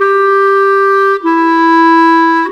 Clarinet; Sonic Foundry (Sony Creative Software); Orchestral Series VOL-4 - Rock and Pop

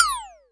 Jomox Xbase888

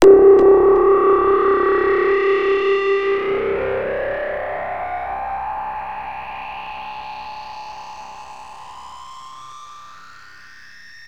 Access Virus B; Synthworx

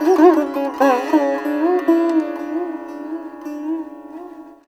SITAR; Spectrasonics; Heart of Asia

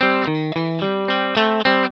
ROCK C 110, Vintage Blues Guitar; USB Soundscan vol.22; AKAI; ROCK2

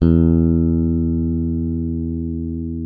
E2, FINGER MUTE; Xsample VOL-1

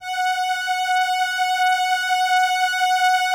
LAMBDA, String Machines

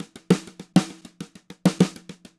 Snare Drum; Sonic Foundry (Sony Creative Software); Joe Vitale Latin Percussion

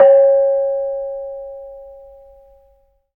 KENONG; Spectrasonics; Heart of Asia

